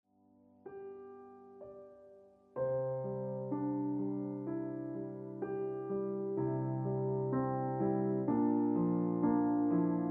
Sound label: Ringtone Piano Music